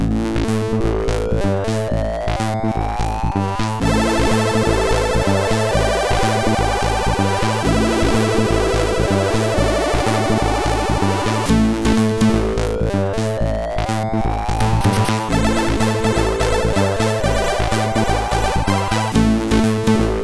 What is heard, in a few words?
sid, MOS6581R4